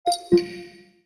Speech Off, Windows

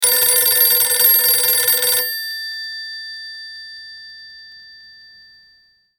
Older Phone, ringtones

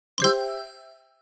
Nokia email

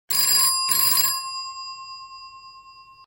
School Phone

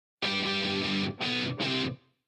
Electric Guitar Riff

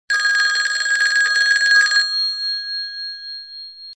Home Phone

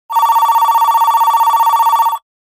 Office Phone

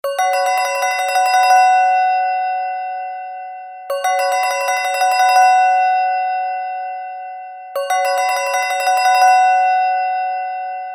Triangle

